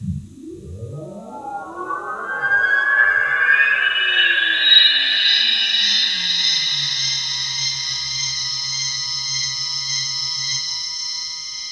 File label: DREAMFILTER, Keys; 3D Sound; 3D Keys VOL-1